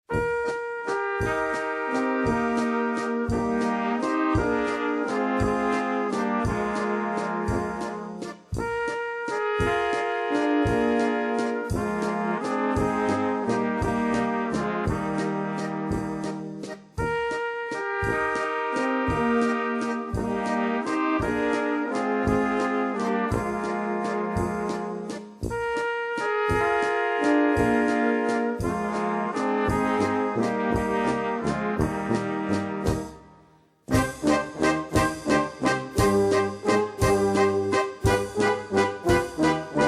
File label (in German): Dorfglocken Walzer, Blechmusikanten